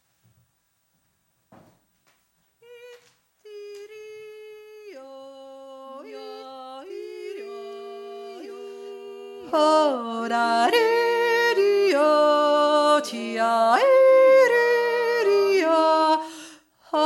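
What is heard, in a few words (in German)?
dreier 3 stimme, jodler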